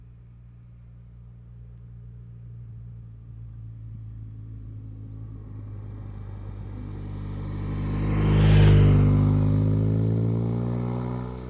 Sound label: Motorcycle Pass 40 Km